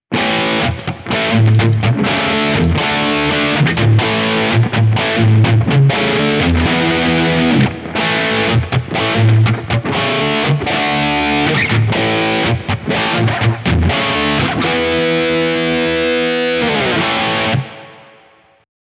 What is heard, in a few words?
Reverb Highgain Long Decay; Prussian Blue